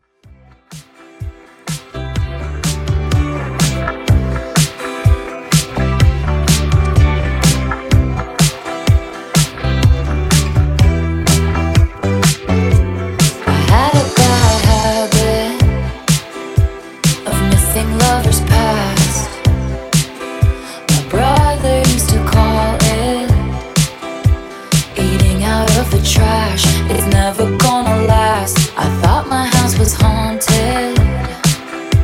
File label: Intro 125Bpm